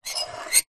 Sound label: foley metal sharp scrape